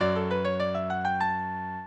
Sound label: minuet, mozart